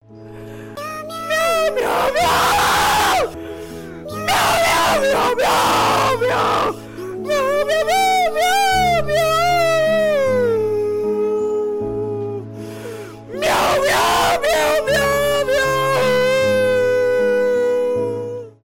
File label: Miou miaww miaw miawww; Litsu; на будильник